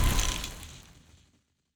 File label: Weapon Stop (Laser); Sci-Fi Sounds